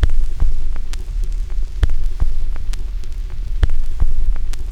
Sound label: CRACK, CRACKL FX